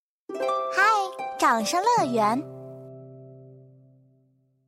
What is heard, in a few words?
Music